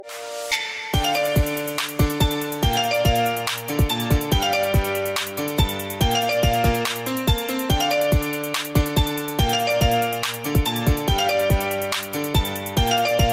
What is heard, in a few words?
Android Mobile Ringtone; ringtones